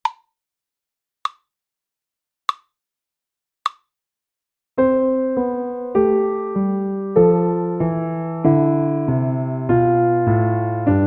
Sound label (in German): Tonleiter und Segne Herr uns diese Gaben slow